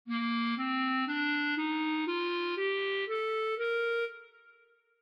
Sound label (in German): C dur, clarinet